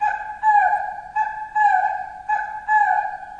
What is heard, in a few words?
jungle bird; Zoo and Nature